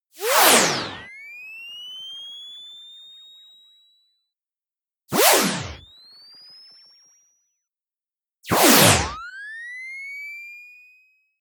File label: charge